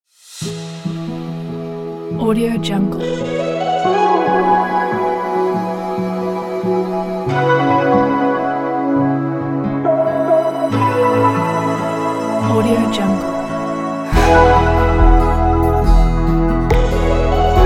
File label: indie acoustic folk